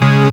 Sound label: synth, Dance Synths Trancers